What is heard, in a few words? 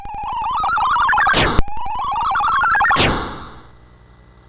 BASIC sid MOS6581R4, GAMES; Sword of Fargoal